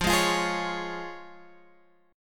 F Minor Sharp 5th, Guitar Open G, chord

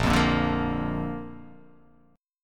Bb Major Bb down; strummed; JGuitar

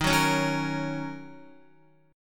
D sharp Minor 7th, Guitar; strummed; JGuitar

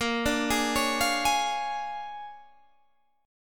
Bb 7th Sharp 9th Bb down Guitar DADGAD; Bb7#9 arpeggiated; JGuitar

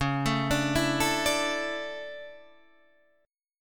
A Suspended 4th, C sharp

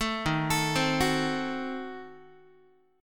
D sharp Diminished 7th D sharp Guitar; arpeggiated; D#dim7; 12,11,12,10,10,x; JGuitar

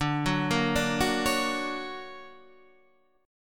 D Augmented Major 7th down Guitar Standard; D+M7 arpeggiated; JGuitar

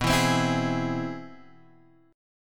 C Augmented Major 7th B

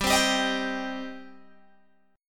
G Suspended 4th Sharp 5th G, down, Guitar, Open G

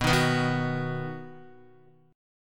B-Major B down Guitar; B strummed; 4F,4A,5C,5G,5C,6E; chord shape; JGuitar

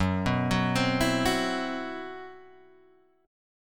C Major 7th Flat 5th Gb, chord

